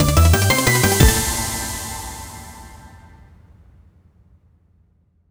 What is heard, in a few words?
free spin outro splash; netent